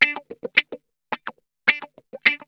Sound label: SCRAPE, Funk Guitar; Zero G - Funk Guitar